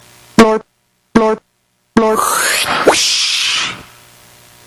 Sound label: Blurt Blurt Whoosh